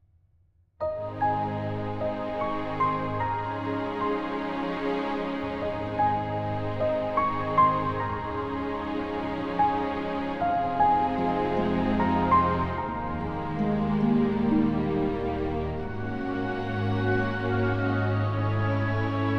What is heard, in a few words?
Emotional theme variation